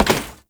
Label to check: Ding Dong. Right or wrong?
Wrong